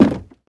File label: wood, player footsteps